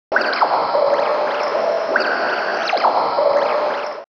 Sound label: water shot